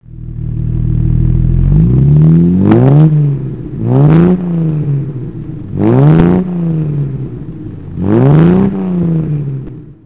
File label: mk2 throttle, classic car